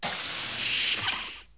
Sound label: door open; federation